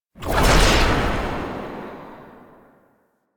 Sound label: Passive Energy Pickup; Warframe; Warframes; Dagath